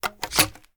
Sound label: reload empty shells in, sh; gmod; wfoly plr sh charlie725